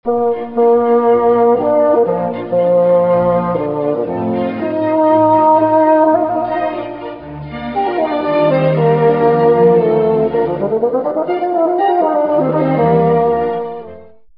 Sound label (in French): basson